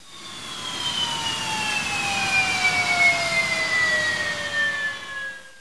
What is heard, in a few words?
LAND; FRAGILE